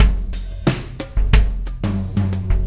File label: Beat, Loops; Sonic Foundry; Not Just Another Pretty Bass; AI Loops - Siggi Baldursson